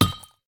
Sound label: grenade bounce